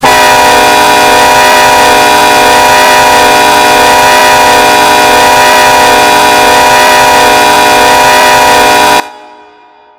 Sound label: k5lla, horn; run 8 v3 horn pack